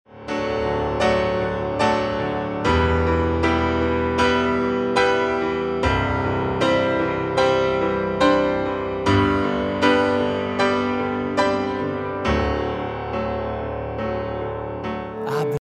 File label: piano snip